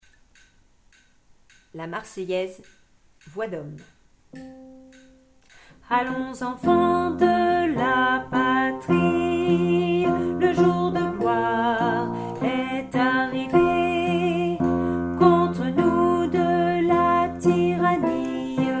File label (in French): la marseillaise hommes